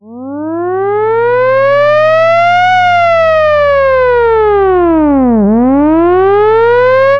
air raid air raid siren

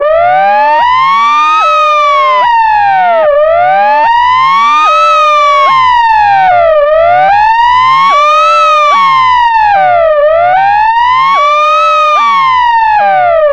ambulance wail siren

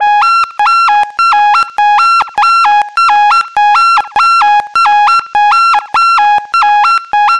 electronic alert siren

